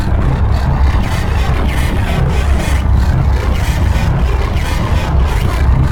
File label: Banshee Missile Travel; Evolve; Elder Kraken